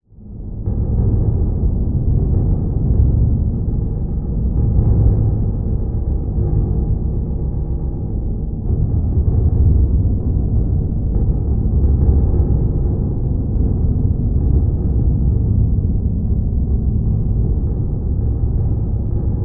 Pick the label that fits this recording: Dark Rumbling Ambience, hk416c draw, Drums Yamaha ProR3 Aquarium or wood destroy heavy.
Dark Rumbling Ambience